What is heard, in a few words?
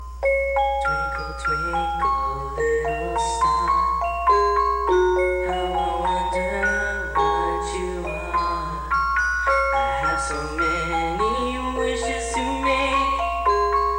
Twinkle little star